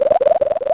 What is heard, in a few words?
Throw Player; Wii